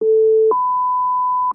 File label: timer start; gorumble